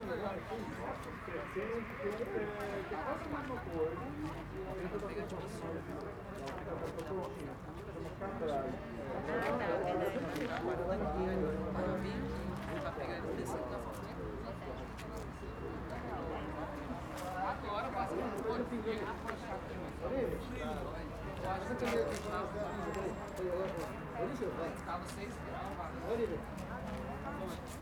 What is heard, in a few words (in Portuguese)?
Posto de gasolina pessoas conversando e andando, carros na estrada; multidao; Colecao Sonora do Cerrado